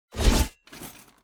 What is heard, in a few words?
npc crusader strike